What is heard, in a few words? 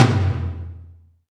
DM5 Tom Hi, Alesis DM5 drum machine